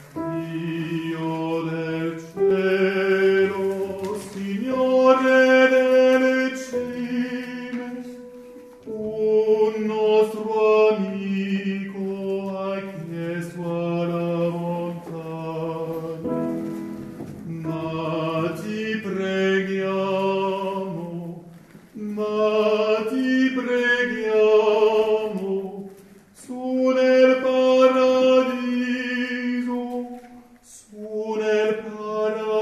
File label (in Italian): Signore delle Cime Soprani